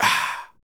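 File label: vox percussion, Vocal Planet Jazz FX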